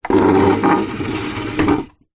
household chair small wooden drag scrape squeak hard ground